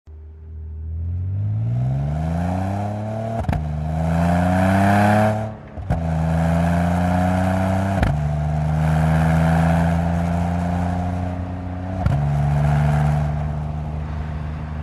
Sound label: AUDI S4